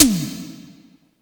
Tom Electro, Yamaha PSR E305

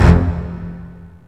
MARCATO; jazz jackrabbit 2; M8

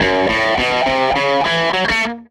SG Guitar Loop

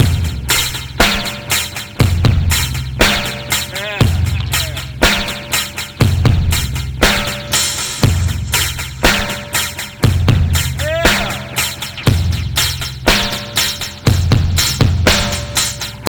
59 bpm, breaks; Yeah; Zero-G Beneath the Planet of the Breaks

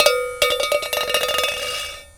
Rattle Can, World Percussion One Shots; Sony CS

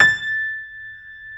JAZZ A0, Keyboards Acoustic; USB Soundscan vol.17

